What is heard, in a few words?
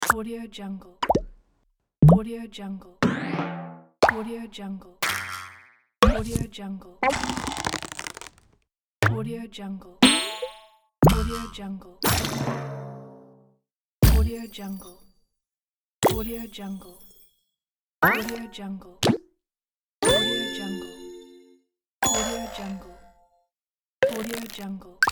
casual cartoon sound pack